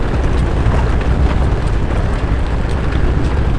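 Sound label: amb mining facility ore loop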